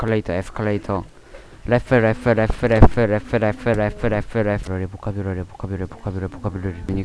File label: laugh